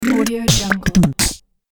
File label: beatbox drum riff speed up